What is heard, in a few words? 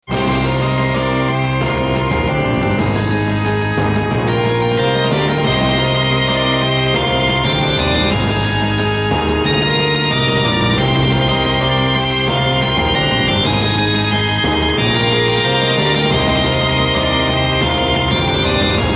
Battle